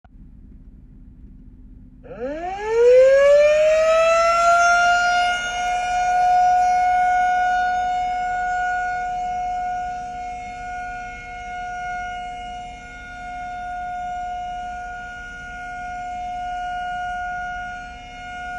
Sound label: tornado siren